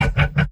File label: laugh evil; pyro; source engine; tf; mvm